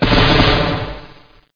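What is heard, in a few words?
weapon; PC Gamer IT CD 15; bgw demo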